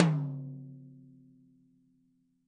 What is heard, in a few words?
T10 F L, TOMS; Living Drums; Sampleheads; Peter Erskine; PB KICK+TOMS